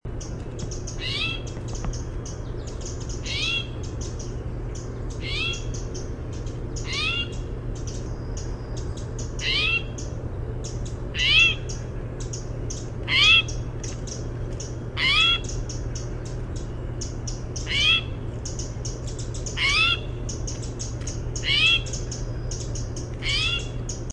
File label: mew